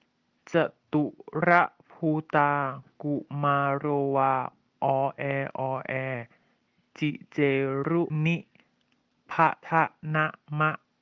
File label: chant